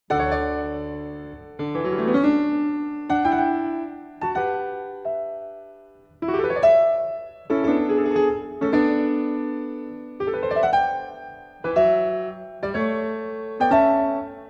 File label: Partita No. 4 in D Major; BWV 828